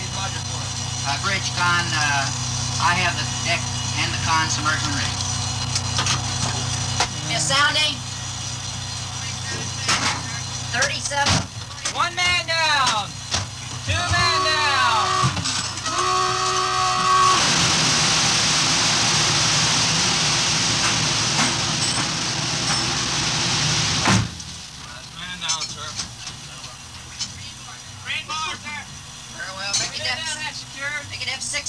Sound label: Dive; Cusk